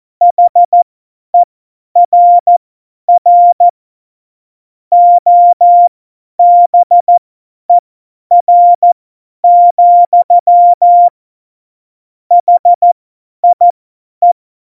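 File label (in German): Witz, cw morsen; Morsekurs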